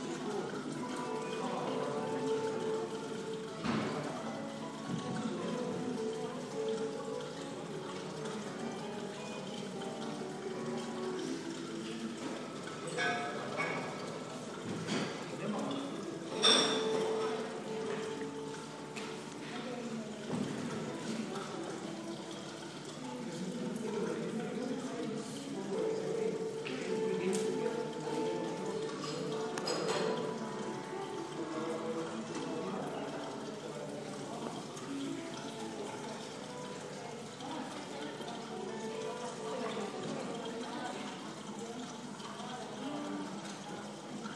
ambience of the spa lounge

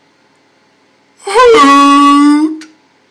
hoot

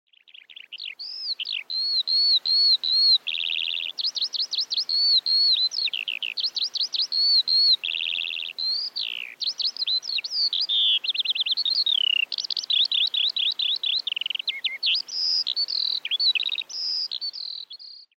skylark

